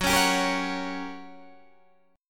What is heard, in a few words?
G Minor Major 7th Flat 5th F sharp